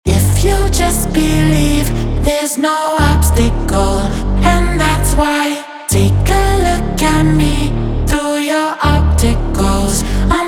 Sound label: Version With Vocal